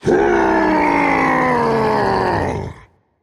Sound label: battlecry rage; chaac